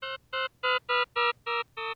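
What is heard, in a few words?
Perfusion Caution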